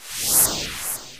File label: warp, effects; lmms